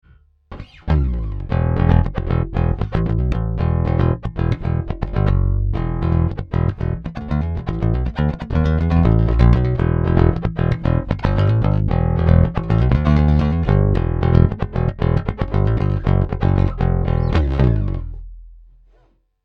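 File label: JB2 Pleck Neck Pickup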